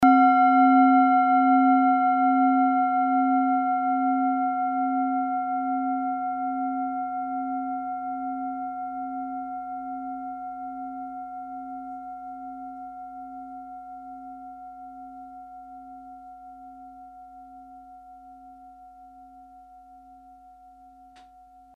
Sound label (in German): klangschale orissa